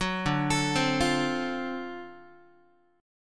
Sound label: D 7th Sharp 9th, Guitar, chord; D7#9 arpeggiated; JGuitar